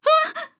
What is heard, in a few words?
Fear; Skye